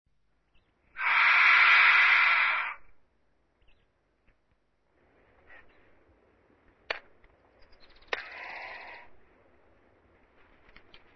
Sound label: Cape Vulture